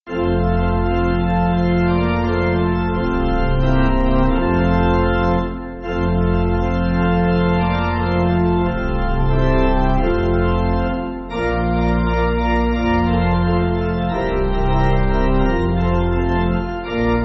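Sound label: Pipe, Pipe Organ, Music Backing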